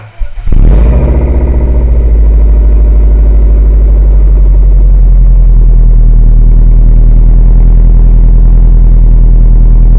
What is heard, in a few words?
WRX 3 pipe sound loud